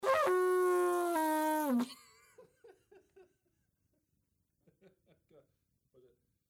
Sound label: Post Horn